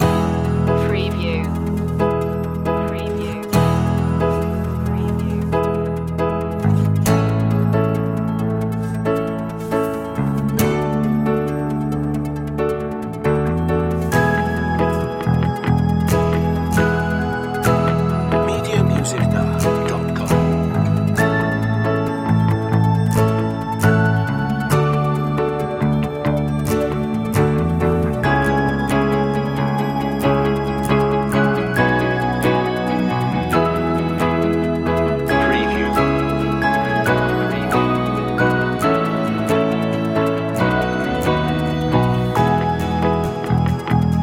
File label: breezy inspiring no drums